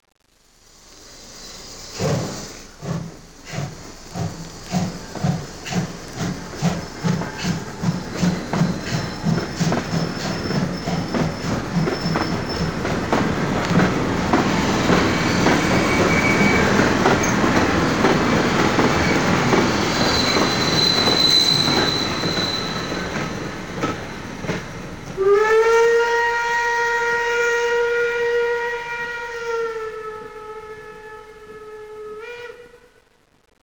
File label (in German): sehr schoene Dampfausfahrt mit Schienenstoss von hinten Links nach hinten rechts; 5.1 Lautsprecher Kanalzuweisung